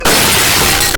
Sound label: glass mega smash